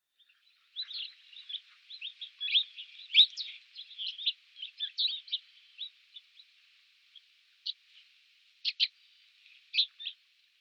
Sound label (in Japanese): hagimashiko, kochi, koe